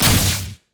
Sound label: spiritrush; LoL; ahri